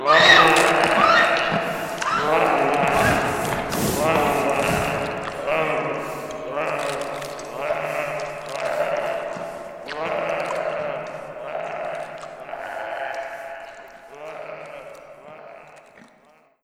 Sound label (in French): mort mange monster croquant; Feed The Beast; Death